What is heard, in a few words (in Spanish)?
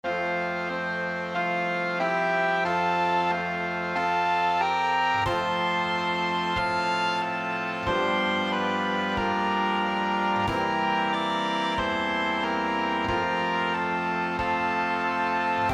marcha, adaptación para marcha procesional, para banda; AVE VERUM CORPUS